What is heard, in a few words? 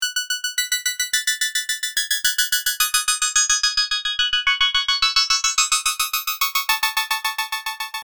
Keys; PatchFactory